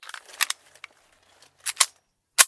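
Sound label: quiet sound of calm reloading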